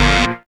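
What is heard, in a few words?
buzz chord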